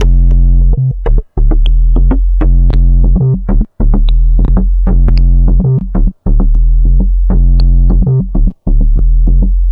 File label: Filter Gate Beat, Electronic Beats; Sonic Foundry (Sony Creative Software); Myths of Technology